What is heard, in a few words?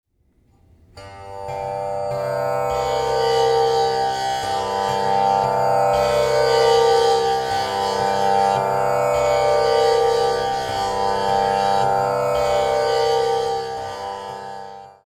Tamboura C